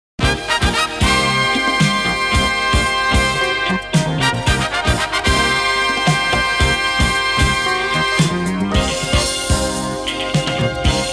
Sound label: heroic trumpets